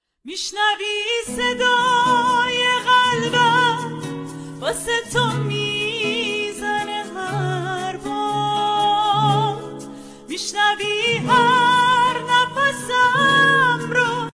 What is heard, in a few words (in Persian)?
gitar